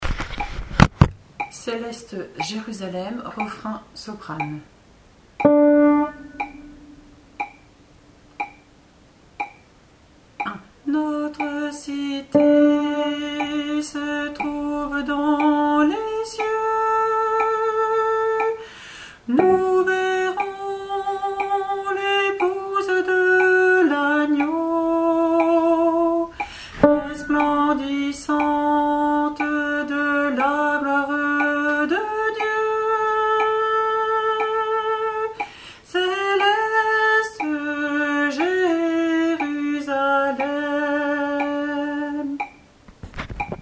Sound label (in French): refrain SOPRANE; Céleste Jérusalem